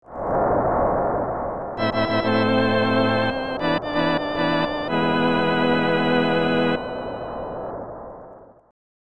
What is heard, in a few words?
Unused Jingle; Hit The Ice; SNES